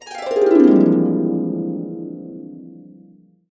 Harps; USB Soundscan vol.62 - Complete Harps; AKAI

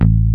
M1 SYNBASS; Kid Nepro; Elite

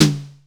Pearl Drum-X, Samples Sliced; m8

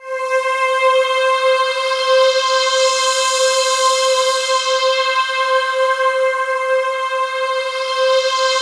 C5, Synth Leads

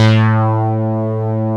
OB FILTER A2; E-MU Formula 4000 Series Vol. 3; Analog Odyssey

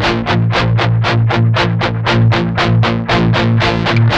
8TH B 2, Guitars; Spectrasonics; Hans Zimmer Guitars VOL-1; 128-JACKHAMR

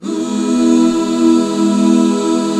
E MAJ, Back Chords; Sound & Vision - Gigapack II CD 1

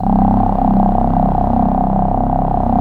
mello choir C2; MELLCHOIR; Synth

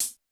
Close, HiHat, Yamaha DTXpress-II

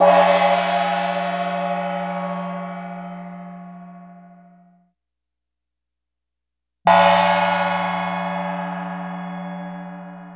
Gong Hits; Sonic Foundry (Sony Creative Software); Continental Drift Aftershocks

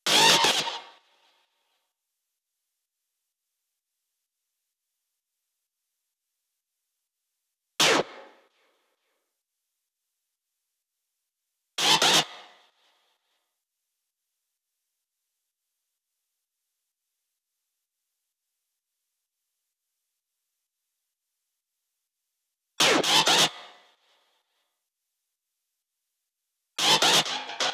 128BPM FX Synth Fill Ins G#; Vengeance - Essential Clubsounds Vol.5; Break the Chains; G#min

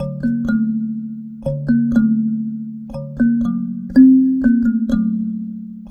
Kalimba, Ambient Melodics

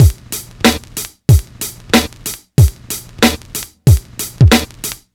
Hip Hop and R&B Drumloops; Best Service ProSamples vol.01; AKAI

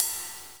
Ride; Equipped Music; Loungin House

